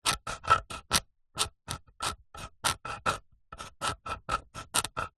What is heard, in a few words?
office pen biro draw shapes or diagram on wood